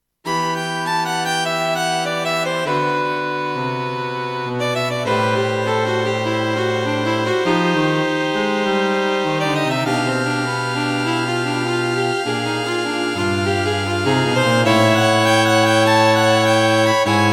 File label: String Quartet; String Quartet for Concert performance; A soothing, lyrical piece with plenty of rhythmic interchanges and interesting harmonies for String quartet.